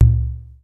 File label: sfx perc